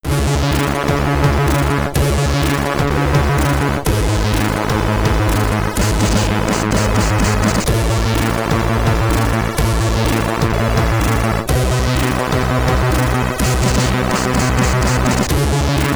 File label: SID Stereo, commodore 64; Hawkeye; Cyberload Mix #01